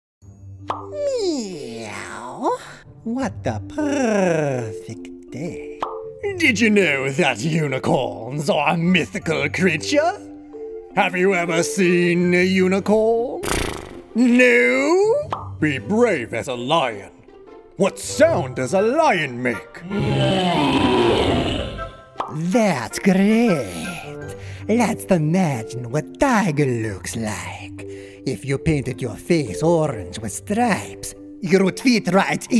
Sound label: animal voices